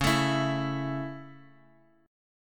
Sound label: Db Minor Sharp 5th, Guitar, Open G